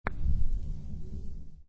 Billiard Pool Snooker Close Up Sound Of Ball Rollling On Felt